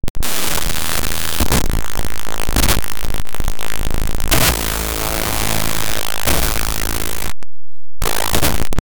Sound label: short burst of digital di; bitcrush